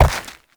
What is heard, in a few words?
Uriel Step Dirt; Warframe; Movement